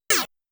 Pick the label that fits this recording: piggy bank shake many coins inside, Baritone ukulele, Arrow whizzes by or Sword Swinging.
Sword Swinging